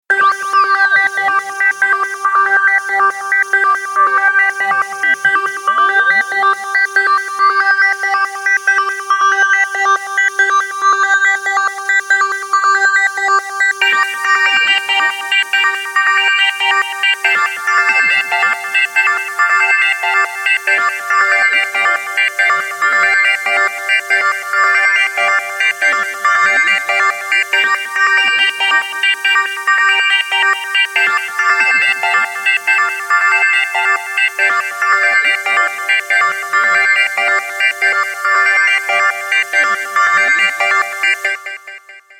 sms

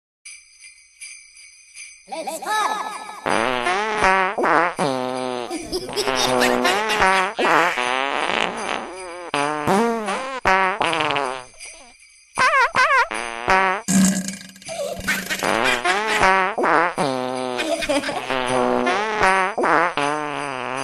fart christmas

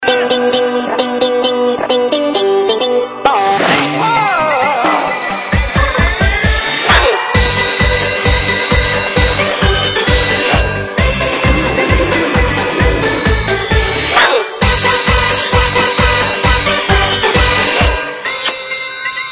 funny jingle bell

